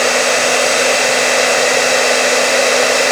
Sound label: Snow Blower